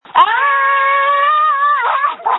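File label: Scream, phone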